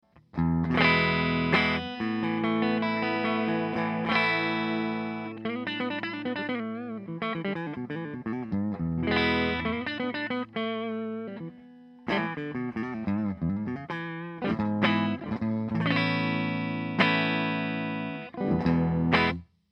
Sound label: Buffered Bypass